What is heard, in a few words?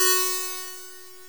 guitar F#4; osu